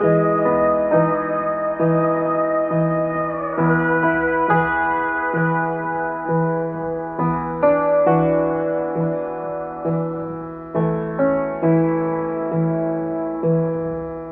piano horns, Loops Sad

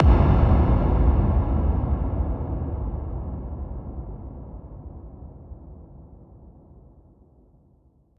electric explosion; Crash Bang Boom

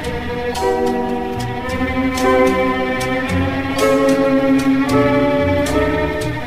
strings, sad

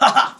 "ha ha"; Voice; ooh ahh

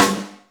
Rimshot, Snares

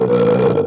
burp short

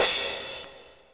Cymbals Normal

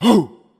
"ooh", voice

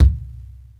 jennifer, Kicks Medium

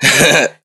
heh heh heh, laugh; Voice; ooh ahh

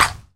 pick, Misc Percussion Ticks

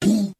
"ooh" male

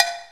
criffy, Cowbell Blocks

